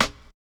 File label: Rim; Drums; Ableton Live 12 Lite Core Library